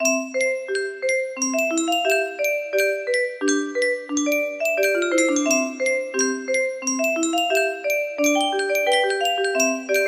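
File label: lullaby, music box melody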